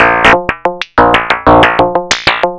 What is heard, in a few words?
FM Perc Loop, Analog Glitch; Polyend; Stazma & Concrete Collage